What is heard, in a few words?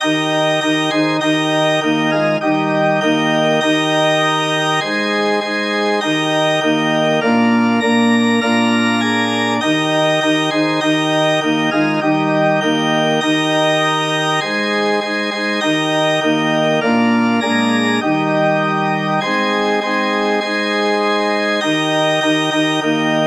organ